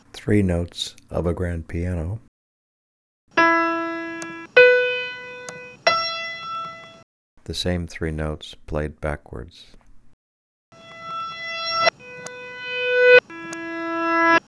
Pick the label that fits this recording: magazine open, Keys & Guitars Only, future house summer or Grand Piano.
Grand Piano